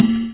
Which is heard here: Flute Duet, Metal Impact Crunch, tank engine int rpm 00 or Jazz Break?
Jazz Break